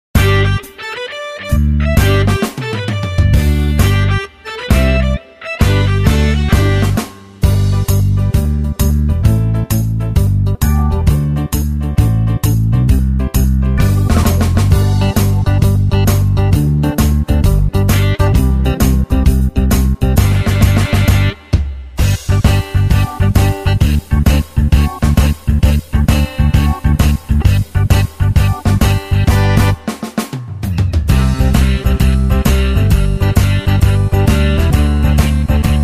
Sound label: Shuffle Rock, KORG Styles